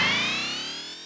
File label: boost; SNES; SMK